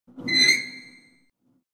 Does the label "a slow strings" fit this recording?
No